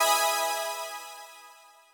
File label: Clear Emaj7